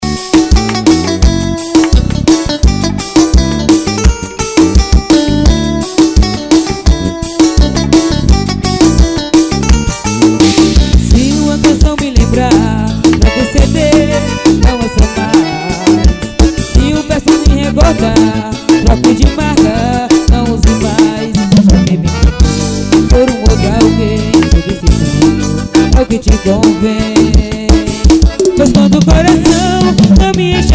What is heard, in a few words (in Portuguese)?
ao vivo no bar; promocional; bar do rogerio